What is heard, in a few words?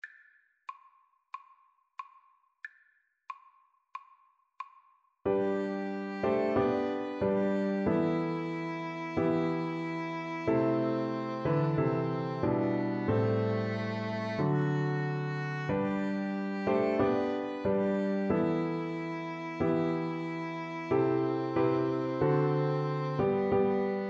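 violin piano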